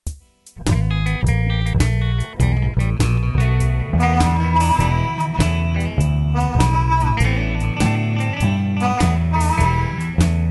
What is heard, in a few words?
BR-8 Blues